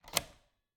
Cabin, front door, deadbolt, lock, latch, thick, open, wide; Eiravaein Works; Vaeyan IV